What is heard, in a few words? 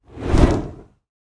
freespin flash animation; Sheriff Of Nottingham